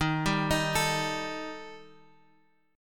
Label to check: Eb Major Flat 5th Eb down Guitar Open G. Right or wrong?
Right